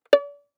Violin pizz ff sul D D5; MIS Pitches - 2014; Strings

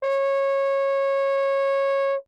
Horn ff Db5, Brass; MIS Pitches - 2014

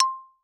Xylophone rosewood ff C6 stereo; MIS Pitches - 2014; Percussion

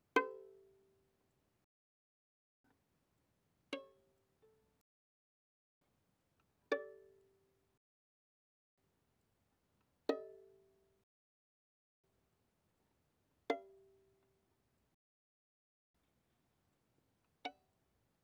Violin pizz pp sul D C5 Db6

